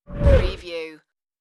Wipe

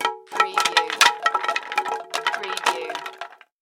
Debris Wood 2x4 Plank

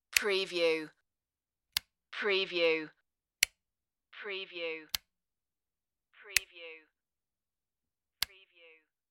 plastic switch

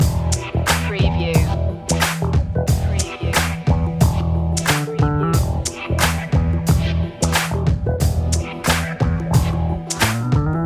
crazy funky bass loop

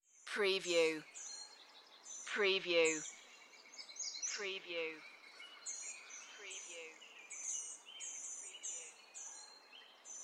Nature Birds

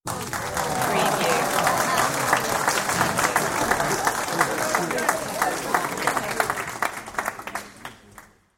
Big Crowd Audience Applause; beanstalk audio

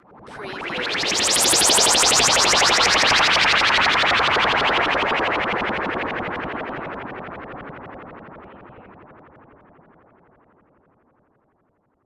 Synth Ascend Sweeper

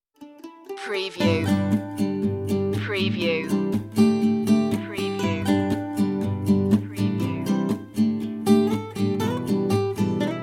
blue eyes guitar

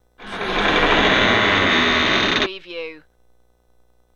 Crpy Dr Crks Opn

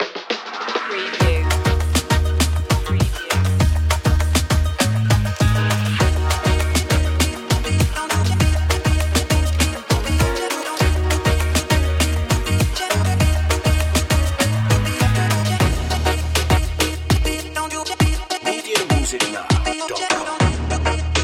cool jamaica style

